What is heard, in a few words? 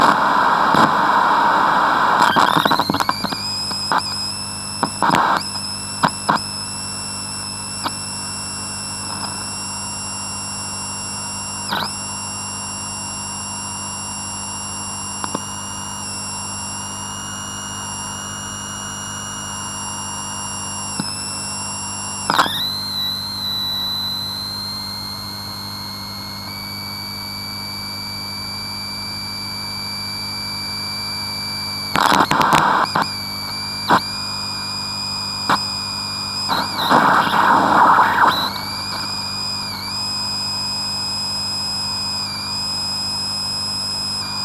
Defective Analogue Television Set